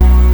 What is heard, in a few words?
Hit